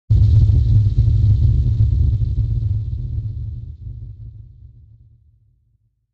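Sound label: Electro Static Sustain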